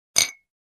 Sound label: Two drinking glasses clinking